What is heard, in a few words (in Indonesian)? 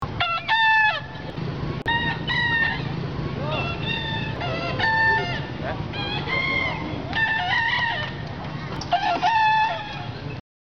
suasana final bekisar kelas utama lomba bekisar